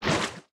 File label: Oil Shoot; Smashy Road Arena android ios 2016